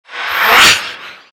spectre grunt

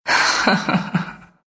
laugh; Dota 2; ru

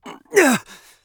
Assassin Grunt Fast; Realm Royale; Shinobi

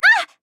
grunt hit; Paladins; Ying

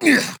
Grunt Attack; Grohk

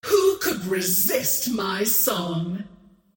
queen of pain scream

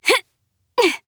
Grunt Jump; Maeve

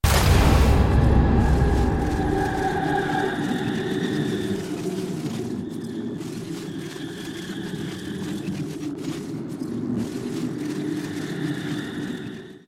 Spark Wraith; Arc Warden

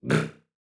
Grunt Slow; Realm Royale; Warrior; Alpha1

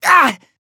Demon Grunt Hit

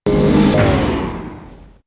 Power Cogs; Clockwerk

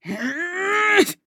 Mage Grunt Fast; Realm Royale; Nightshade

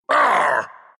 pain, ru; Dota 2

